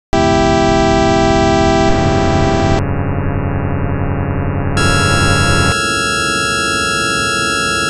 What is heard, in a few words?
sound, synth